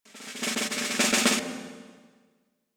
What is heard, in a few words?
drum roll